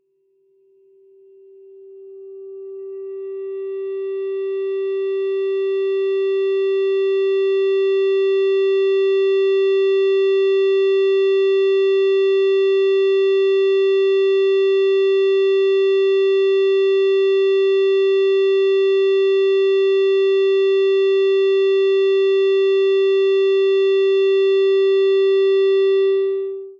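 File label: Distorted Feedback G 3rd String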